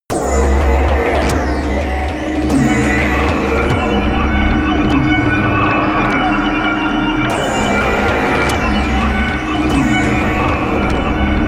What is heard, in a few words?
drone mercury